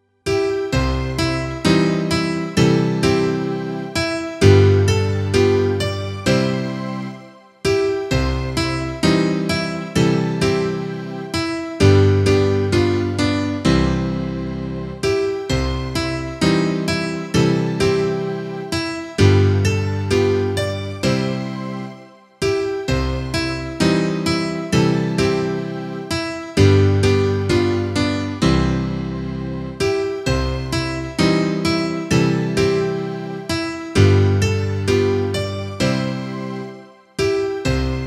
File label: instrumental